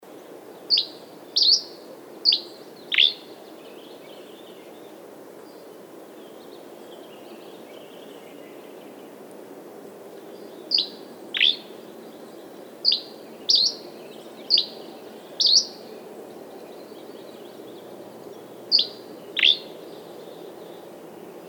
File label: Dusky Flycatcher, Empidonax oberholseri; flycatchers